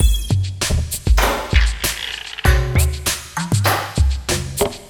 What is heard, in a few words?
HALT LP, Industrial Loops; 98 HALT